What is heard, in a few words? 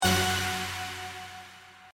Fast Line; Holy Goat; winline